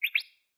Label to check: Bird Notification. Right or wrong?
Right